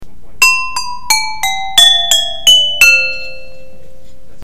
copper scale

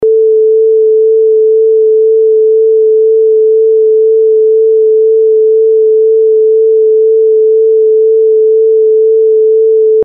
vib tone

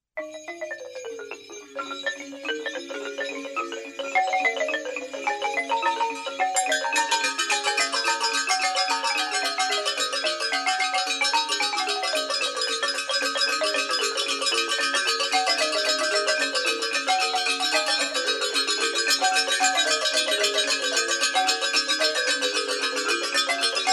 balafon

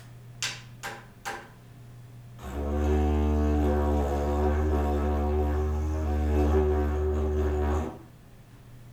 digifu room

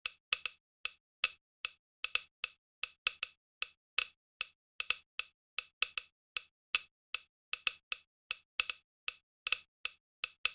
Sweep Rhy

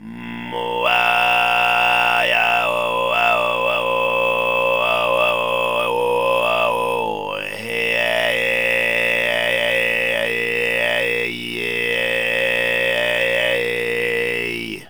skarg, Throatsinging; phy103

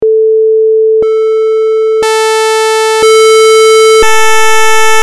triangle and saw

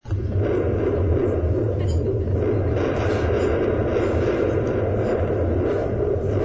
metal sheet, piezo

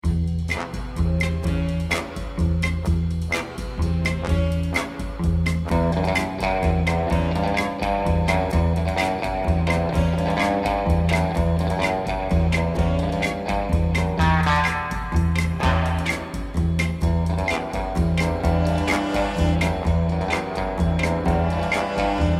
Waltz; Bonds

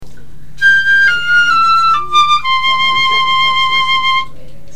piccolo scale

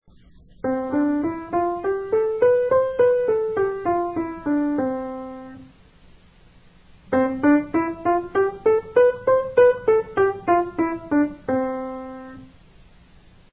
c4 scale A

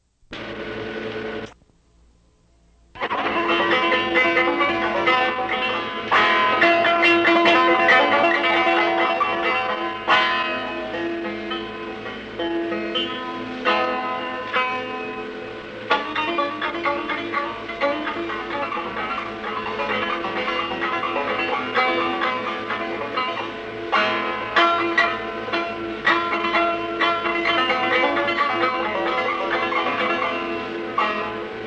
Banjo Noodle

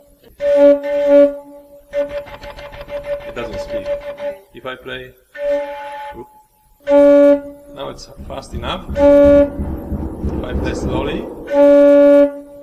organ voicing

